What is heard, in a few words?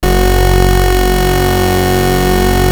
OB F2 -R, Rare Synthesizer; Hollywood Edge - Giorgio Moroder Rare Synthesizer Collection; OB 4 VOX.